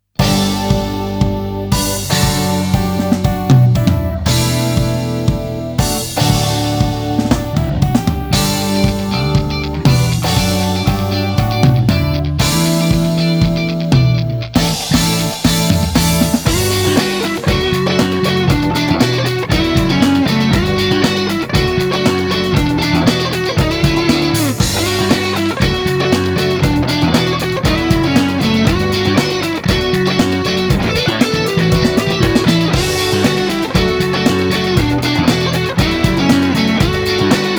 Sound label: Mellow Waves